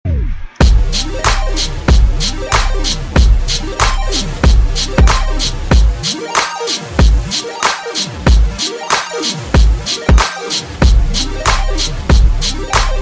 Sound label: Dub